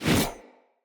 Axe Whoosh; Weapons; Enemy; Axes; Glades Axe

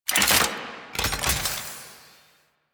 Mech Alt Fire Return, Rifle; Thanotech; Trumna Prime

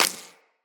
Bullet Impact Ricochet

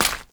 Step Dirt, Footsteps; Warframe; Grineer; Royal Jester; Foley

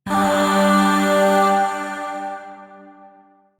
Shawzin Chromatic Chord; Warframe; Zariman; Void Shawzin; Chords

